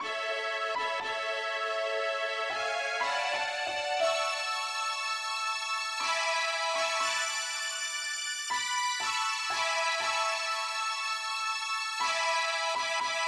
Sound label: mandolin Lead key a